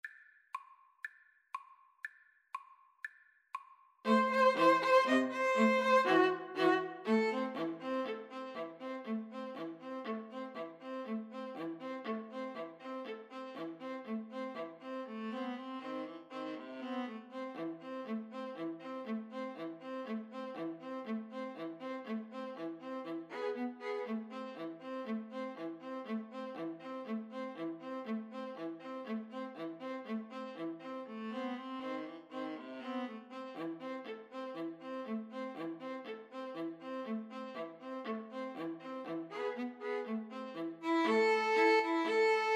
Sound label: freilechs von der chuppe, 2 violins viola